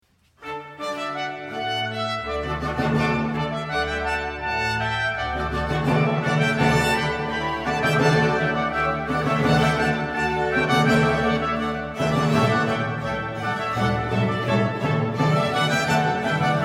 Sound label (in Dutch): bas; luxemburg